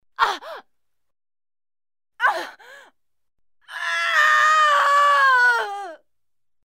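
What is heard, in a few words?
female groaning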